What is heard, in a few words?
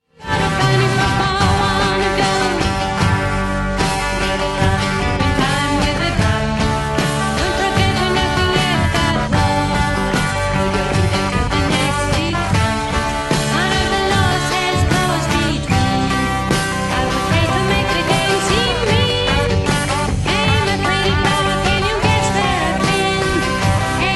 drum